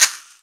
Shaker

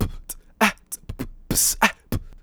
tippy, Beatbox, Drum loops

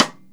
Snares, Rimshot

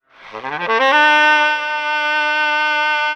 saxy roll

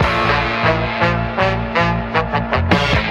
horns, Phonky loops

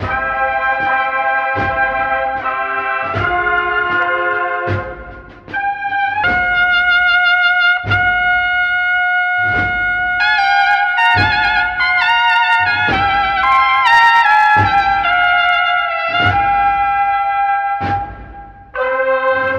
horns, jazz loops

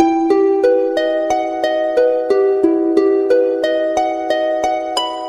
Loops Electronic

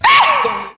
"ah", Voice ooh ahh

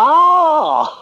"oh", boy

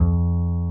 forging, Bass; Notes

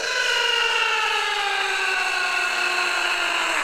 scream male down scratch; ooh ahh

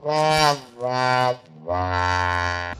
fail horn

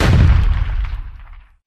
gun shot; Crash Bang Boom

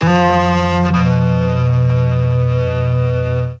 strings sawtooth, Sad Loops

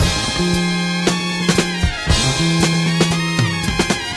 horns, Loops, Phonky

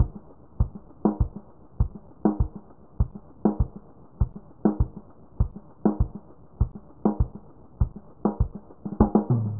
marengue, drum loops

